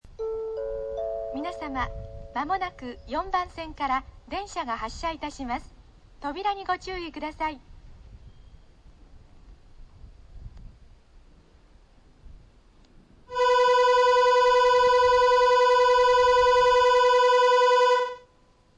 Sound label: bell; 4番線発車放送＋出発時機合図; 泉大津; 普通車発車時; 南海